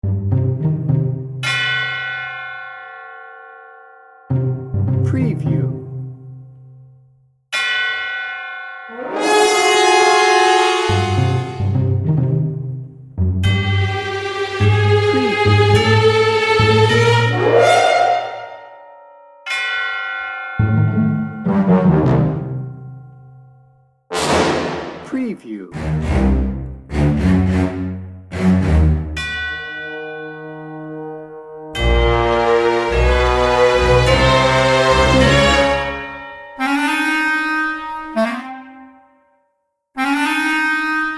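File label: sneaky creepy lite no percussion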